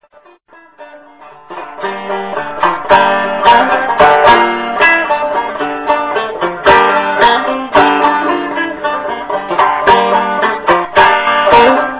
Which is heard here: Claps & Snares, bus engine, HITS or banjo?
banjo